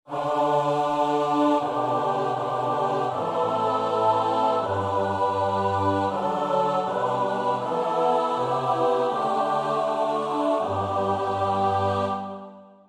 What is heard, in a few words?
Psalm 85 (S-A-T-B)